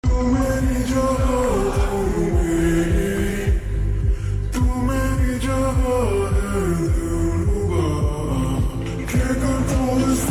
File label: Slowed Reverb; Mismatched 3